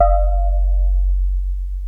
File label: Electric Pianos; Wizoo Electric Pianos